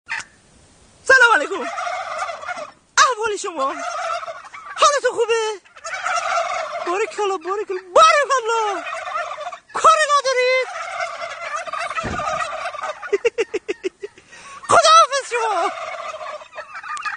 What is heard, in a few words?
turkeys gobbling sound